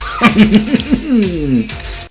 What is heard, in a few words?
hahmhmhmmm